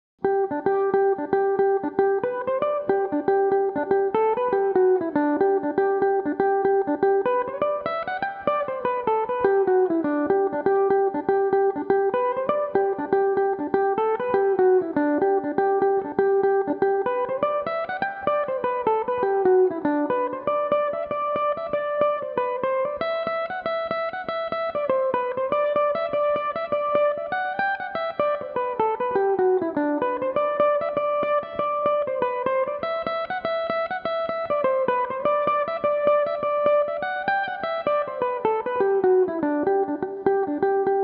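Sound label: G major, irish mandolin, tunes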